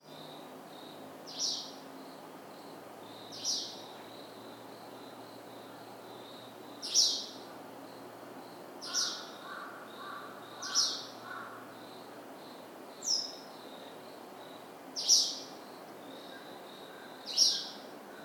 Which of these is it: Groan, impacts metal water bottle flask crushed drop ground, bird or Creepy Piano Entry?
bird